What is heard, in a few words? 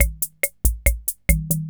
140 POPS